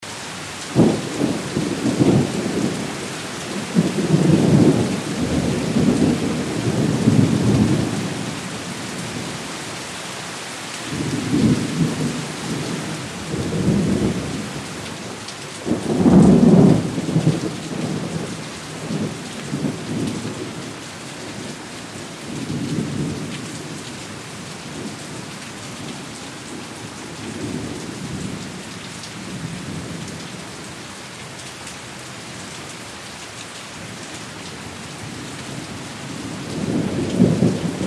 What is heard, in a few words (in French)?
Orage pluie loop; Orages